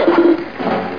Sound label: dive in sound; bugs bunny